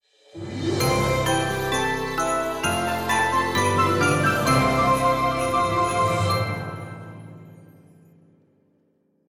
Christmas Spirit Logo Positive Magical

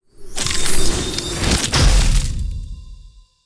White Magic Casting an Icy Spell Low Thud; Cristian Lucchetta

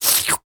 Wet Tongue Licking; Julien Matthey; Human Reactions

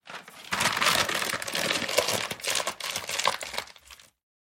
Espresso Opening Coffee Bag; Systematic Sound

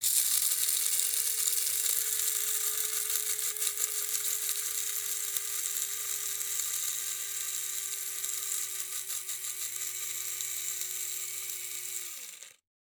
Coffee Beans Grinder; Eiravaein Works; Vaeyan

